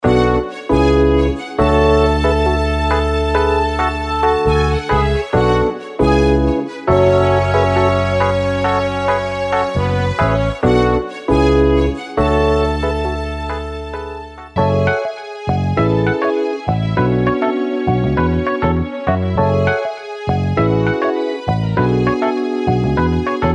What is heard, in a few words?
70s hip ho